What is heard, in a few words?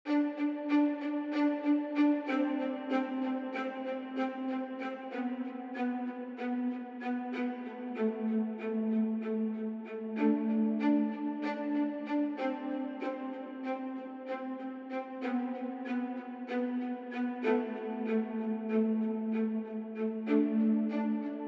Strings